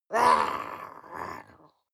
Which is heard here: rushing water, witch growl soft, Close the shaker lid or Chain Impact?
witch growl soft